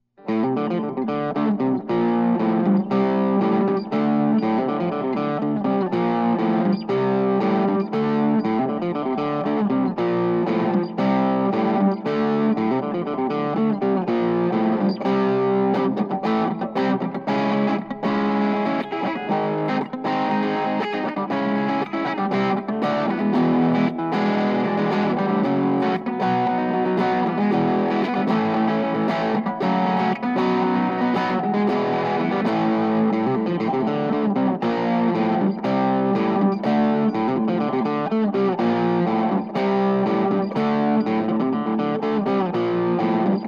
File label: klein headless black; 1992